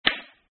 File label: audio card out